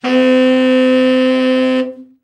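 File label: TENOR overblow, Sax; Giga Samples Collection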